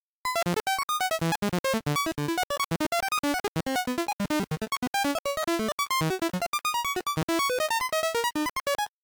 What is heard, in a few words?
bip bip